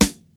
clicky snare single hit c key, acoustic snare sample tuned to the C note